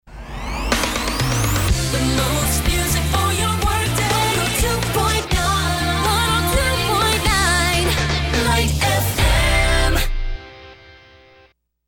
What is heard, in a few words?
FM Jingle; WLTE